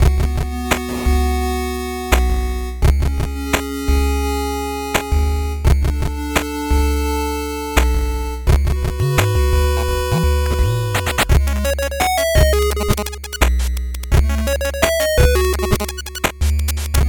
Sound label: 8bit bgm normal